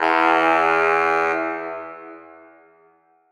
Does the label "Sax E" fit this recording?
Yes